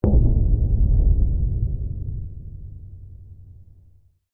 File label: Explosion Tail; Go Record